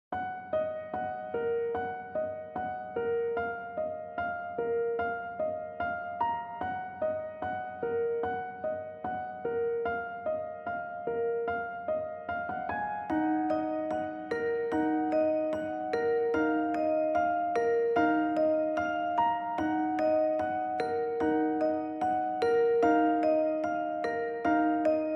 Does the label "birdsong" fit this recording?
No